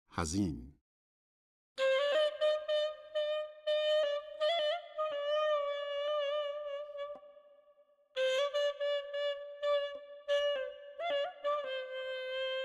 Hazin Mahur Radif-e Ney; Dastgah Mahur

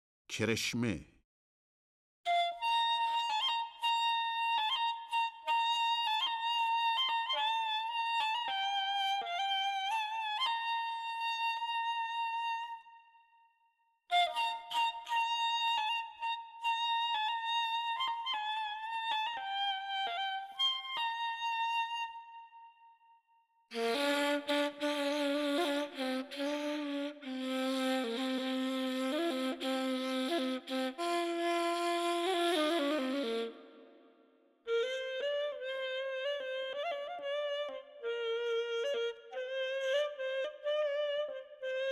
Kereshmeh Mahur Radif-e Ney; Dastgah Mahur

